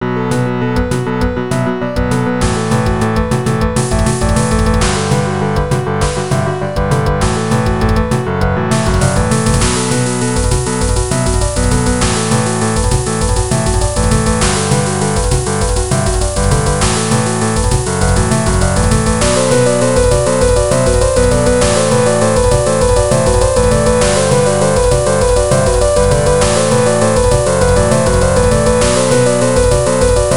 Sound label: riff